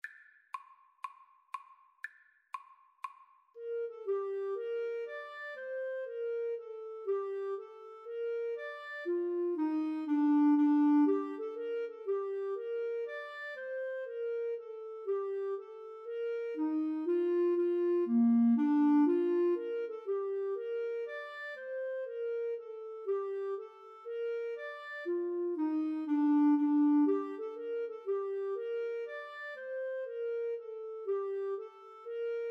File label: bouree, Flute Clarinet Duet; bach